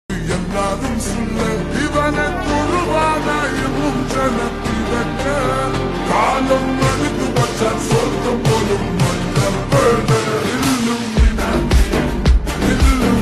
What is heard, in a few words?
music mallulofi song remix; aavesham climax